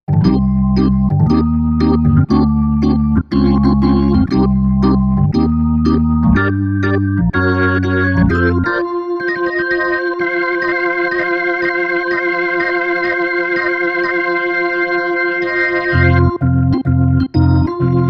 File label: Bow Vib